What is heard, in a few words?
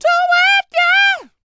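falsetto, R&B Dance; Spectrasonics Vocal Planet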